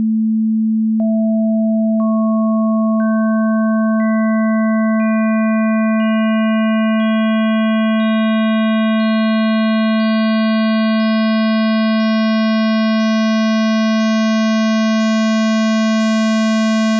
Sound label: Additive 220Hz Square Wave